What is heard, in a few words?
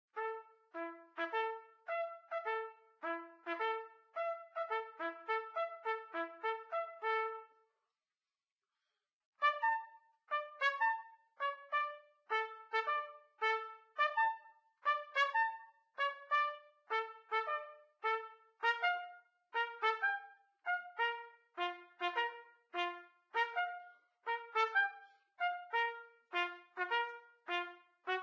Trumpet Training